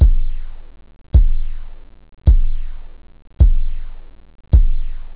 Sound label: short, drums techno; OctaMED SoundStudio V1